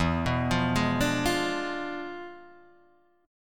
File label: E 7th Flat 9th